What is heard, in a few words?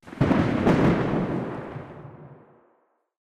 thunder; source engine; episodic